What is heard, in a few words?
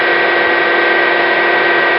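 Compressor Loop, Train; OpenBVE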